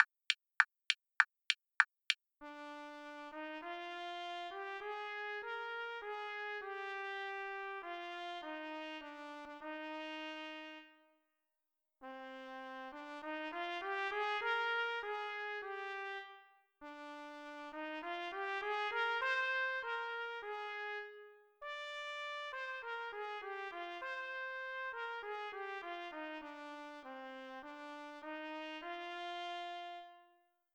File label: trumpet fast